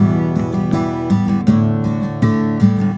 EM GTR, Celtic Flavours

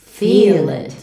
VOX VOX; Sound & Vision - Gigapack II CD 2

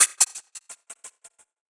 Gated Cymbal; musicradar

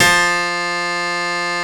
GRAND FF F2, PIANO

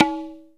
Ethnic Perc, Percussion; Dance Synths

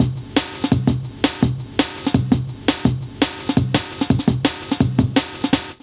LOOP, Jungle

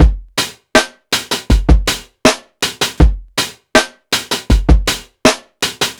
PICCOLO 80 CHANGES STYLE, DRUMS; BASEMENT DRUMS VOL 2

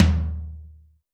tom, Zoom MRT-3B; Kit2

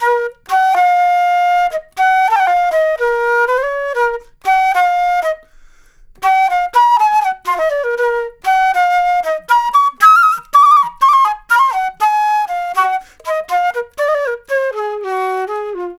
Flute Solo, Jazz

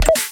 Weird, snare

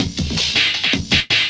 Loop, Drum & Bass, 160 BPM

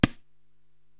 Tabla Mute, Korg TR-Rack

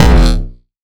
YARD FOOT KICK

